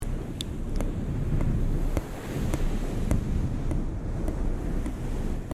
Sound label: Tongue clicking